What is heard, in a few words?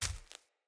Walk Veg, Footsteps; Enemy; Mockery; Mockeries